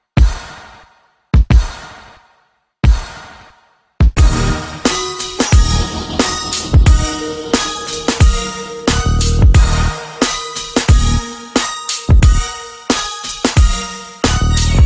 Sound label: black beat, hip hop